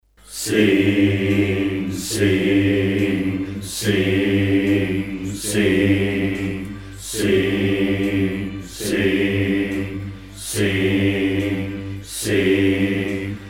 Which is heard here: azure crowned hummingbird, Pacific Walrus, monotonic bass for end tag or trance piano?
monotonic bass for end tag